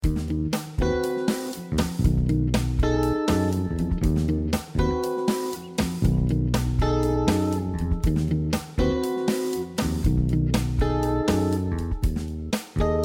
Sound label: Eb Locrian bb7, ear training; Direct Application Harmonic Major